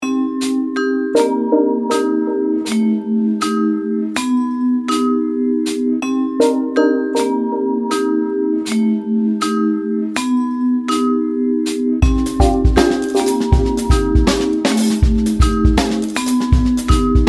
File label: lounge jazz